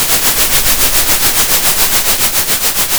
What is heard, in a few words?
pop, Sound of a train